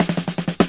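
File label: drum roll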